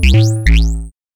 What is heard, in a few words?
SNTH BASS ELCTR 140 A, Techno